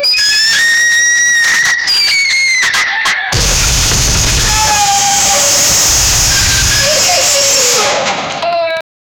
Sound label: machine gun firing woman